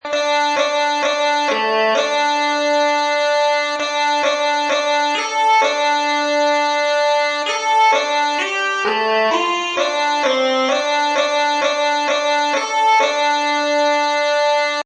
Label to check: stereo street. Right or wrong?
Wrong